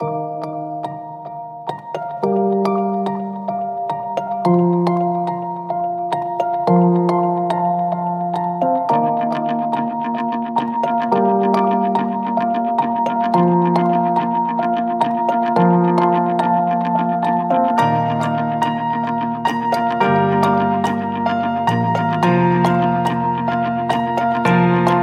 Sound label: Ball Heel Music